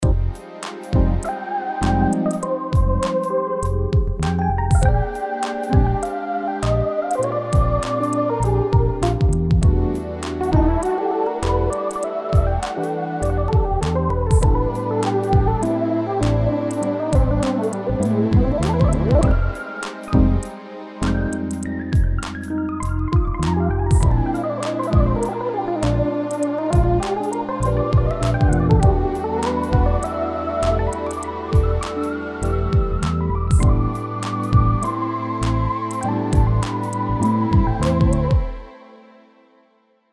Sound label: Xpand Cool Jazz; Randy Emata